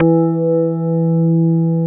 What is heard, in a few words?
WOOD BA; Synclavier Sampler Library; KEYS AND GUITARS